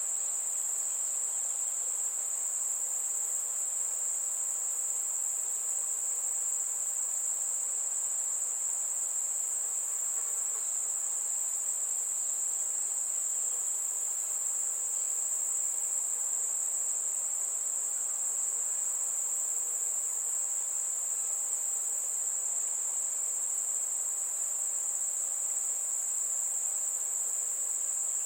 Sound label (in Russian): penie ptic i zhuzhzhanie muh letom